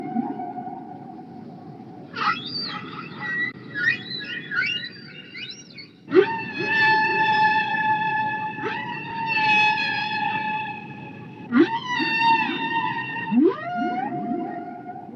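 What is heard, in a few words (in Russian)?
крики касаток на глубине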